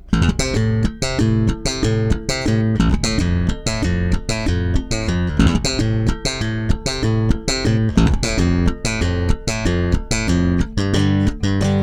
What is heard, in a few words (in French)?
strumming, basse; cort custom